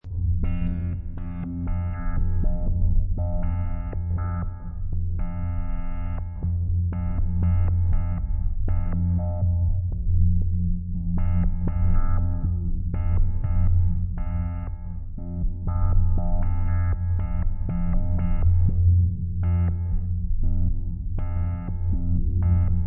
Rhythmic Reactor, Strange machine, pulsating plasma